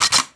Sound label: item pickup weapon; interface